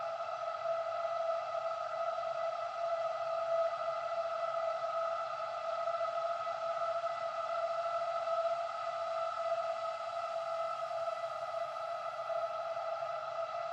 High Action

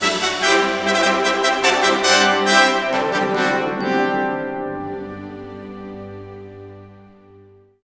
did good

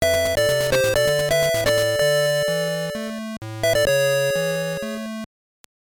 Jingle; Ninku; Game Boy

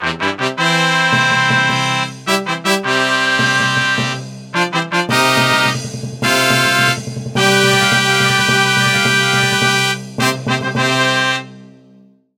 fanfare long; High Sea Saga

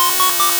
Lock On; sfx; attack moves; pokemon; gen 2; gsc

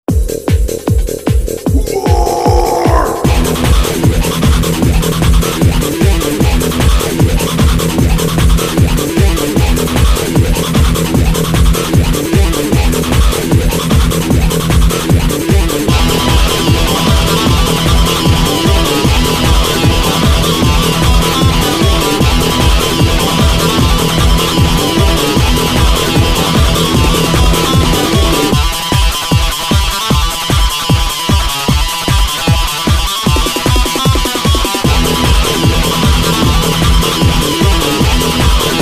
Fight; Serious Sam

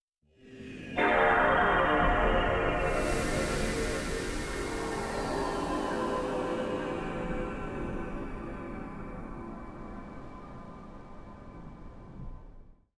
Bootup, playstation 2 system music

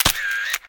Taking Picture

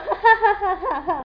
Voice Laugh; Scratch

